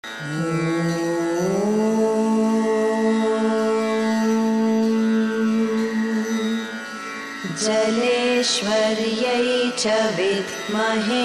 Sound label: ganga gayatri